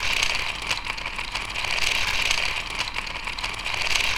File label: motor